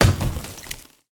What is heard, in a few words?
car tree wood impact; factorio 2.0.55